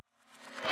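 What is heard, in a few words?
Battery Put-Down Reverse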